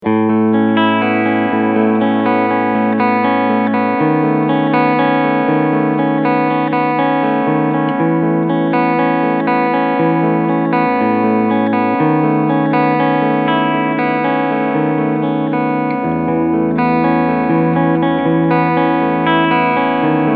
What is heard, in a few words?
Clean